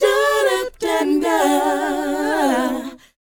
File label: DOWOP A; ILIO; Vocal Planet